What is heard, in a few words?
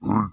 snort, pig